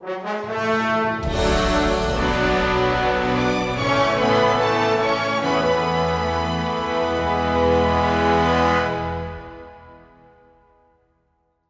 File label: Level Up Stinger